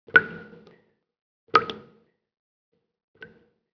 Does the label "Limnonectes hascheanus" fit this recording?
No